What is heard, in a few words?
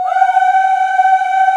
VOX, African Aahs; Roland LCDP11 Africa VOL-1